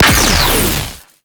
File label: Burst Laser Shot; Warframe; Teralyst; Ropalolyst; Abilities